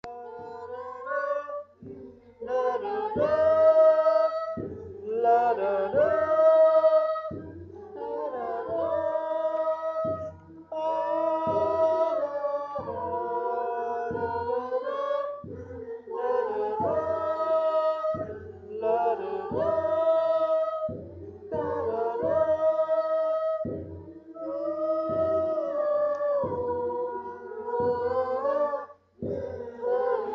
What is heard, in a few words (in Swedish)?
Festsang Sopran; Ronneby Folkteater